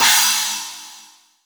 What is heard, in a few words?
china splash